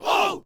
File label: people shout "oo"